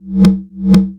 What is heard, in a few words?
conga, drums; CMP - Analogon